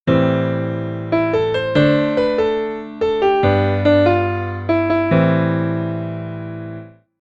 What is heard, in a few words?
C-F-G-C